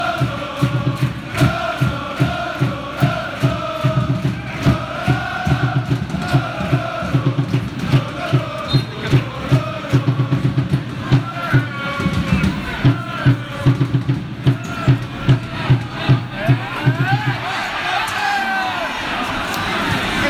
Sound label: Football Supporters In Stadium